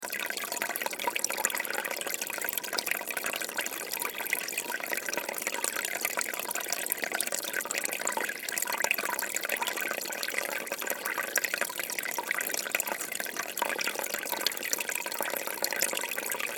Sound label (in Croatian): potok